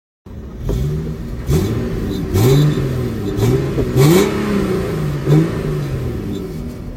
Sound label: weird high pitched noise, g37 sedan